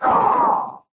pain death; Slork

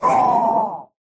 pain death; Slork

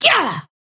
effort melee small

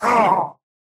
pain big; Slork

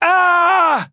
pain big; Trapper

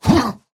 effort melee small; Slork

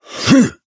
effort melee small

